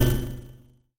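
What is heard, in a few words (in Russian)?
электронный звук удара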